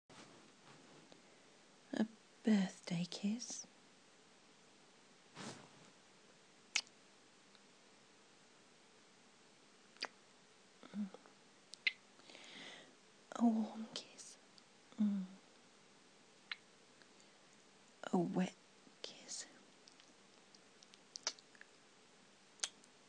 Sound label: moans, sucking noises, heartfelt poem; f4a; Let Me Give You Every Kind Of Kiss